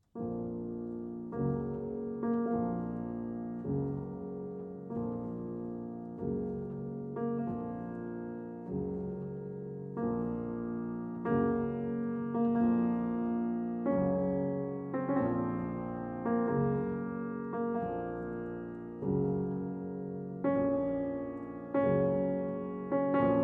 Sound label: Piano Sonata in B-Flat Minor, Marche Funèbre; Piano Sonata No. 2; Op. 35; Funeral March Sonata